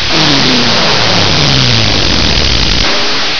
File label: beam